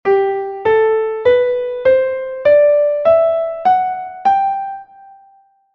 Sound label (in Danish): G-dur skala